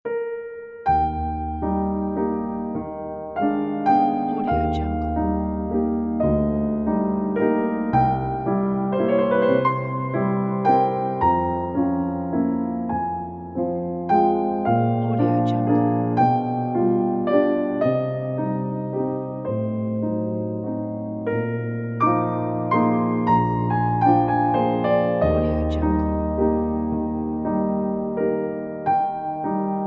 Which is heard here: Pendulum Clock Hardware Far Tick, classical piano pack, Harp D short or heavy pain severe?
classical piano pack